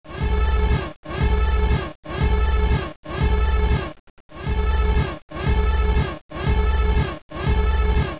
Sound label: alarm; de tuscan cz